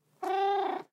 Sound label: purr eow, cat; ayuncraft-1.5